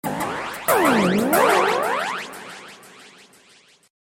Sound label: Laser